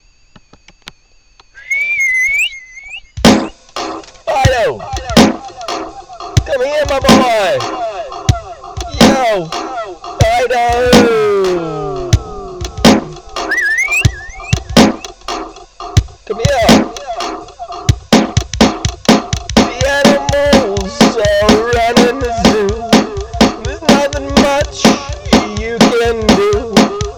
vocal; Freedom Extended Play

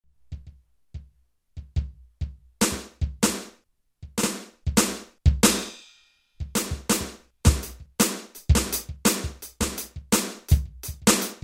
Mountain Music Electric Drums

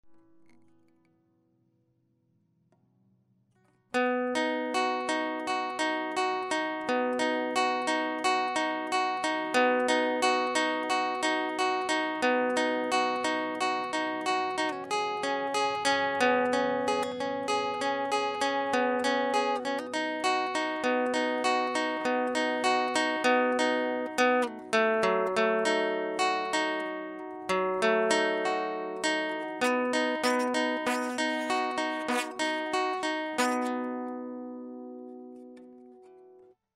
Finger Picking Interlude

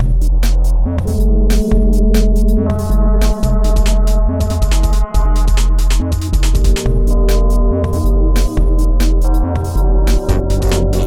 Dubstep Studio

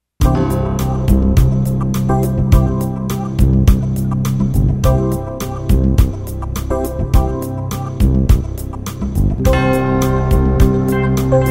Mountain Music Keyboards

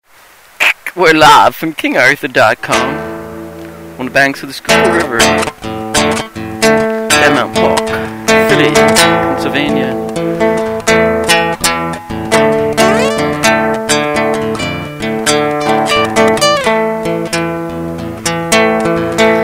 Loop Test; Extreme Musical Experiments

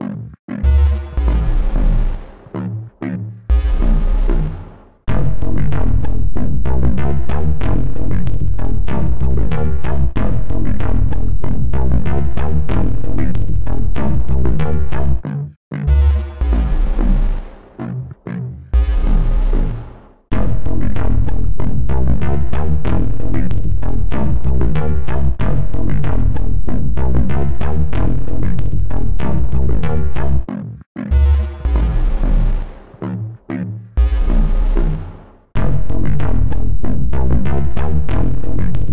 Dubstep

